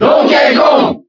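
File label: Cheer French, crowd; Donkey Kong; SSBB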